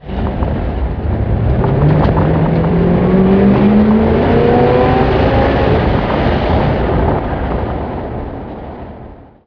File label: truck pass distant; Half Life Model Pack; Half Life 2